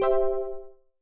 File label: alert, Simple sound